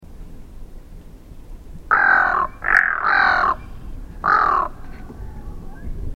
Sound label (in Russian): belosheynaya gagara golos